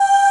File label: Voices G5; D-SoundPRO 3.1.2J